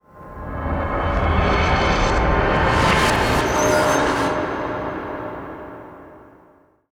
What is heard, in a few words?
vanishing; svencoop